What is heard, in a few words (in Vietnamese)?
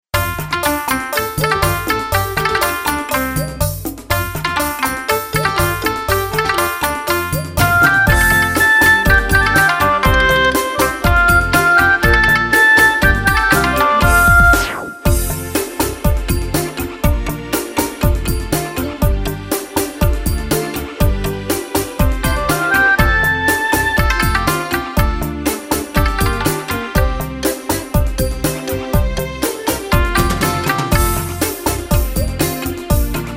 CHUC TET BEAT